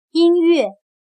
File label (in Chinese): 音乐